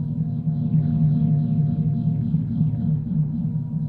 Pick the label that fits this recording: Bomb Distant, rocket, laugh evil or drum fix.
rocket